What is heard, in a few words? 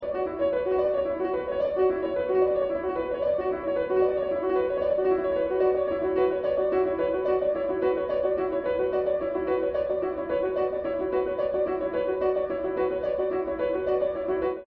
piano phase shift